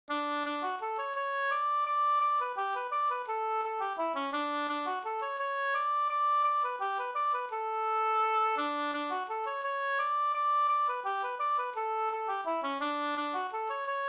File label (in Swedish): vals; Johan Petter Landholm